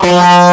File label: EG DIST BF2, FUNK